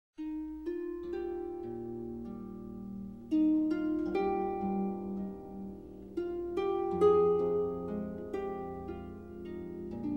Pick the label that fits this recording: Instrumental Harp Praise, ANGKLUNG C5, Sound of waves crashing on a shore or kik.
Instrumental Harp Praise